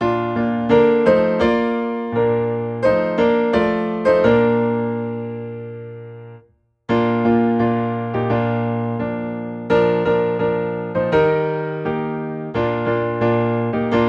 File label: Piano in B Flat; Bringing in the Sheaves